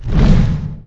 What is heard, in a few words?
BIG WING; SHADMAST